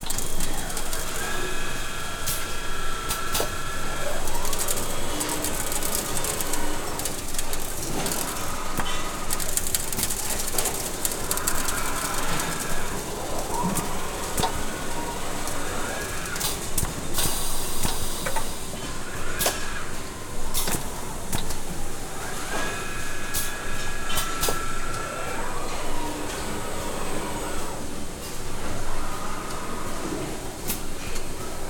Close Up - Machine, Air Compressor, Crackling, Servo; Double Trouble Audio